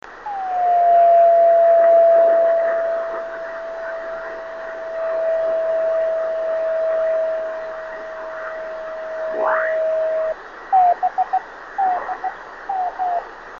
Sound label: 26860KHz